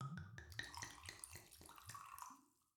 beat rum pouring; goldentavern

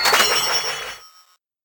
bonus wheel cashout; koi princess

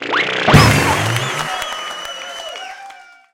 big Win Text Change; scruffy duck

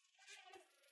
amb Insect Oct Up; willyshotchillies

